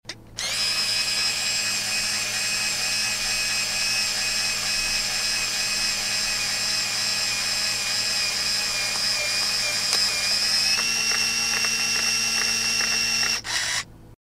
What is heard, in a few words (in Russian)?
zvuk motora kamery